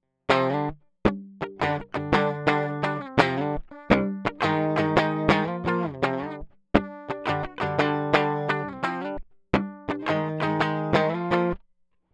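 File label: guitar DI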